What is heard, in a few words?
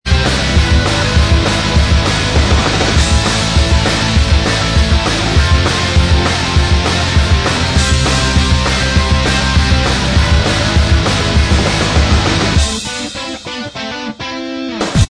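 Instrumental Track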